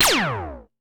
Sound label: laser beam phew